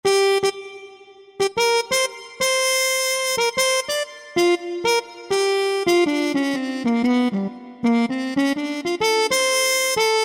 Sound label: Muted Trumpet, Yamaha PSR-3000; Factory Voices